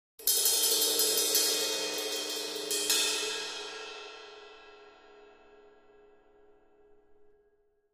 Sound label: Cymbal Trill